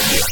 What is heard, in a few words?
Zzapp